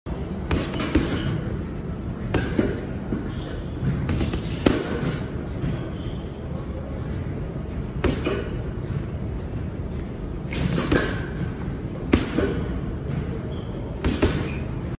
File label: Punching punching bag; مشت زدن به کیسه بوکس